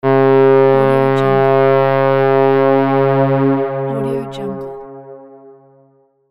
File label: ship horn